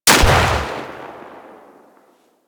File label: Fire Shot, Weapons Lever Action Rifle; Warframe; Tenno; Sybaris Prime